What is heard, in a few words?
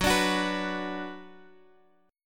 F sharp Suspended 4th Sharp 5th; strummed; x,x,4,7,7,7; down